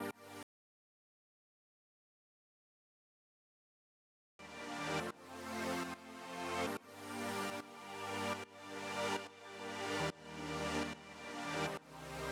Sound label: Pad Gm